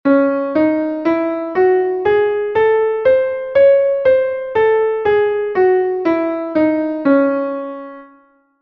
do sostenido menor armonica

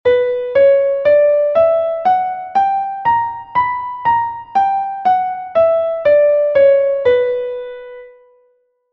si menor armonica

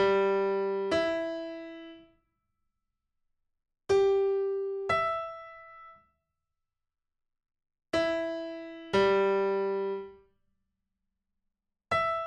Intervalo sexta mayor

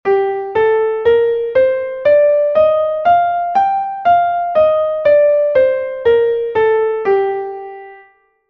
sol menor natural

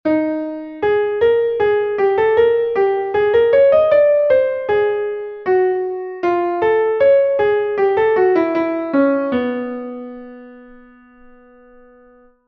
musica dissonante

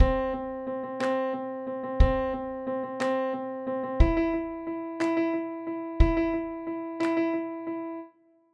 trecillo

